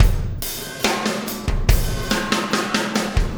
Impact Crater Beat, Processed Drumkits; Sonic Foundry (Sony Creative Software); Processed Drumkits Zero Gravity Beats

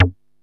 JU 106, SEQ-FX; Masterbits; Vintage Voltage

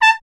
BRS TP, Trumpet; Miroslav Vitous

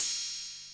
hat, Techno 138 BPM

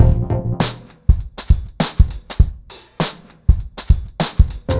Remixed Drums 100BPM; The Roots Drumloops

